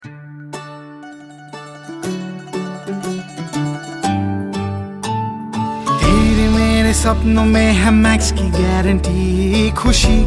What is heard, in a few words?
Hindi Song; TVC; Max Cement